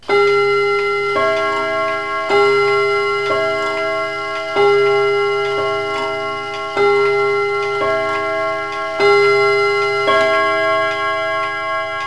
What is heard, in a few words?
clock